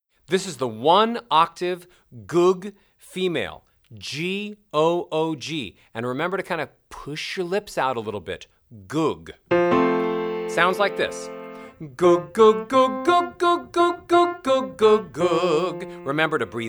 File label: One Octave Female